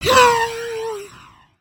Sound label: idle, creatures organic